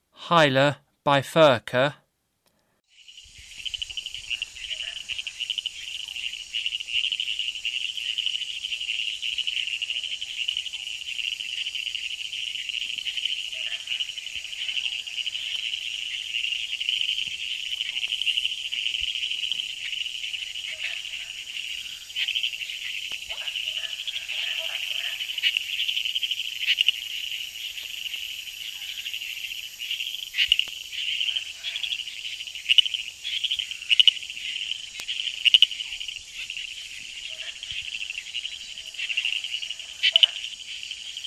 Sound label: Hyla bifurca, Frogs of Ecuadorian Amazon; S. America